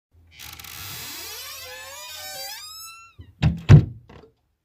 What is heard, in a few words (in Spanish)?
SONIDO DE PUERTA CERRANDO